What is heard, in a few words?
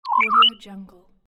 android